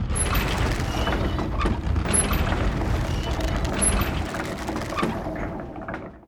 Mine Pulley Up; KuberaMine

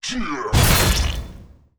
Temple Gargoyle attack; Arenill

